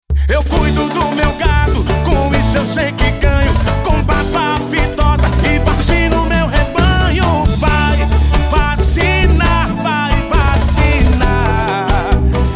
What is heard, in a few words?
Jingle; Aftsoa 2016